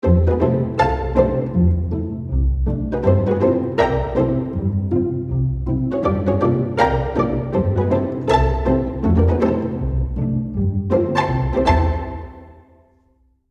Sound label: Chamber, pizz; Spitfire